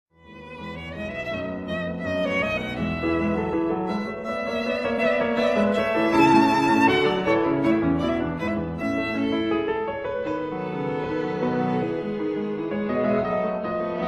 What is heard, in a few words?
Sonata for violin & piano in A major, Allegretto con variazioni